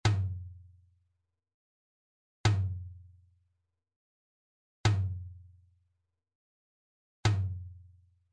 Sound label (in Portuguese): Toque - Surdo Medio; fanfarra